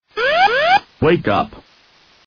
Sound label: Wake Up Alarm